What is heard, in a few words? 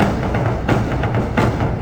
tribal, drum loops